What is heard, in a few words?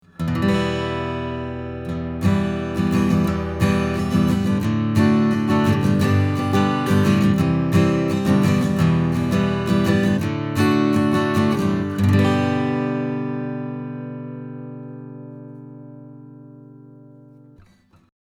Acoustic Git Strum 30cm 15 Fret; Austrian Audio OC18